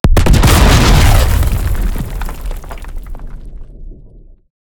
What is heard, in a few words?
Meteor Impact